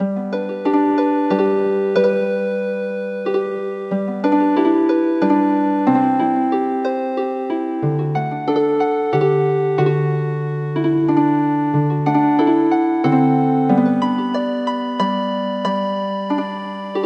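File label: Healing Harp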